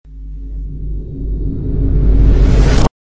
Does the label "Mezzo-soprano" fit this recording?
No